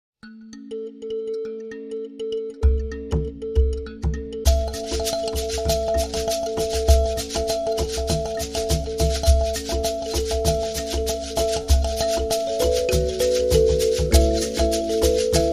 Thumb Piano Rhythm

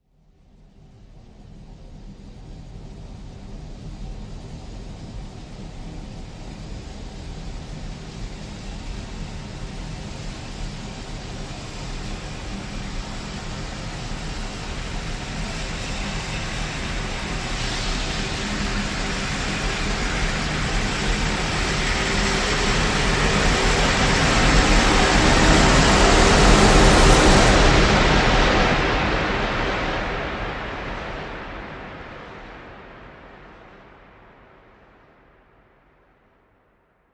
Rise Long Back and Forth

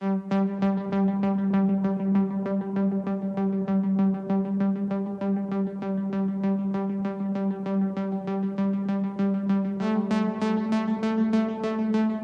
Chords

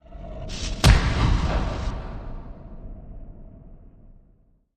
Hard Echoey Impact Sweep

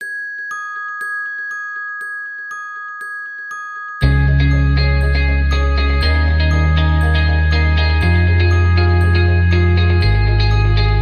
No Drums No Melody

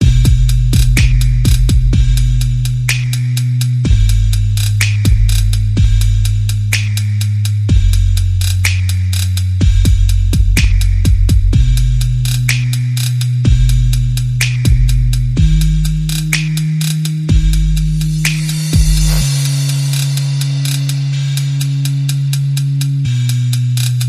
percussion bass and effects

